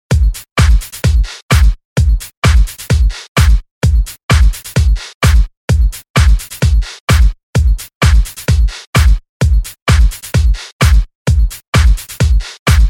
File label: Electro remix